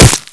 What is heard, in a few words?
zombie strike